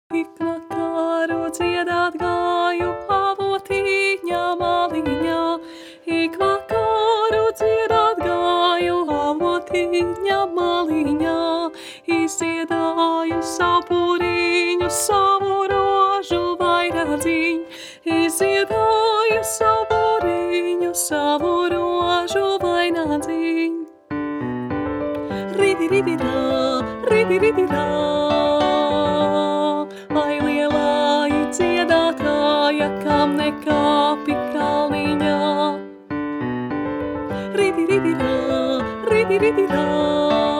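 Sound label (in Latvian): Altos; Ik Vakaru Dziedat Gaju; Vidusskolas kora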